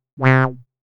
Wah, synthlead

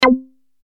Strings hit

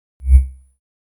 Soft A, synthlead

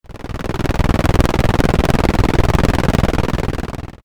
Old machine

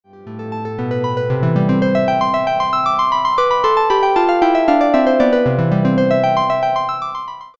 Harp

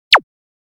E-Tom, e-drums

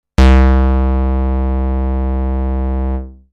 Basic Pulse, synthlead

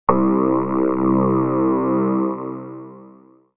Moonwalker, synth lead

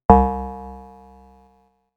Bell, synthlead

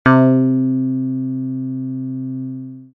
Triton, synth lead

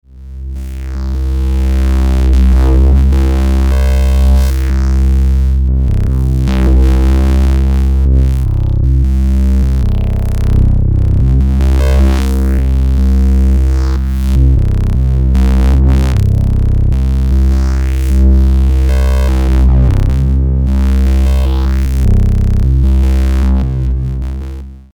Phase Formator, texture

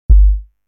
Bass Drum; drums

